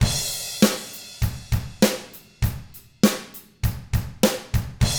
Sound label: ROCK, Drums; ILIO; Double Platinum Drums 1